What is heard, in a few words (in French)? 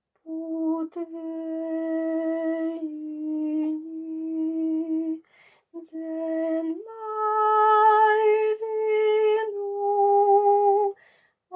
alto; Jurjaanu a puut veejinji letton; Put Vejini